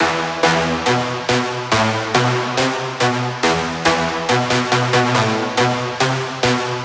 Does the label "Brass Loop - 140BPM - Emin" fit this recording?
Yes